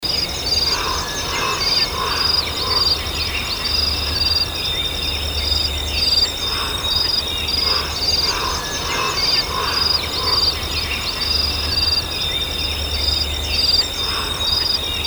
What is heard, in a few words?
birds forest atmo